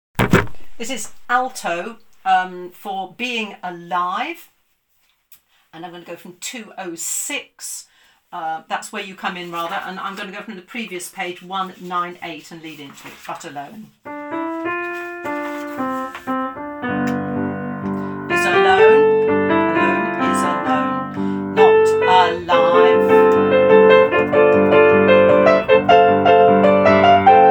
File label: Altos